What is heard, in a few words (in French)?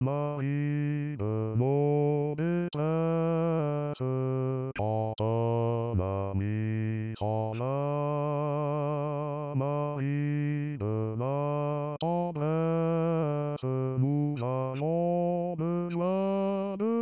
basse